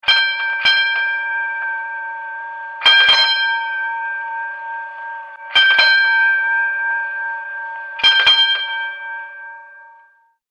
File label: Antique Street Car Bell; Streetcars (Sound Effects:Vehicles)